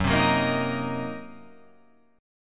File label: Fsharp 6th Flat 5th down, chord